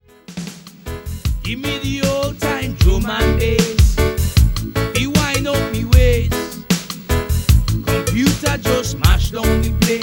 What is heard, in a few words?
Old Time Drum & Bass